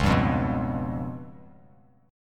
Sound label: Db 7th Flat 5th, Bass Standard; Db7b5 strummed; JGuitar